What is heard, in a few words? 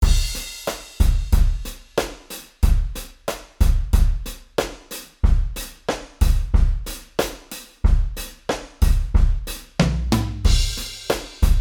modal surf, drums